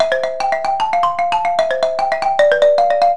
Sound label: padauk soprano, Padauk marimba; keys are rounded over and polished for beauty and pitch; Performance Soprano; keys: 2 3/4" padauk; 18 key C4 to D6 soprano; resonators: 3" PVC single unit, detachable; frame:oak, stand up model